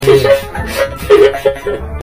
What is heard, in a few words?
laugh; walle